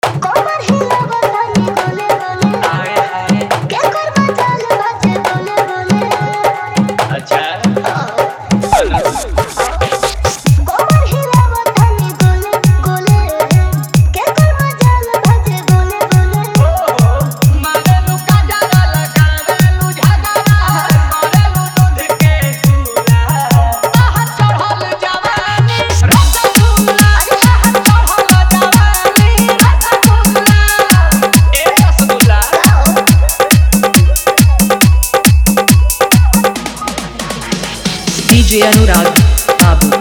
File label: Desi Hard Dhollki Bass Remix